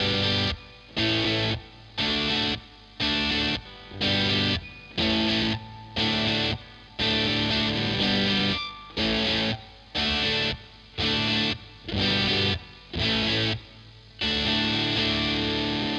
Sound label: tone